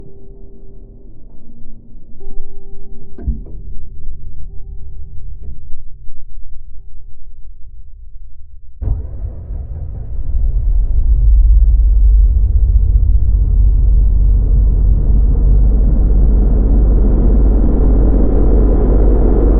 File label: ambient